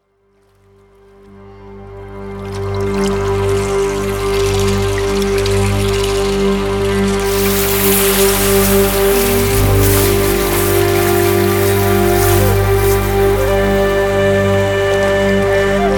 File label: 396 Hz Solfeggio Frequency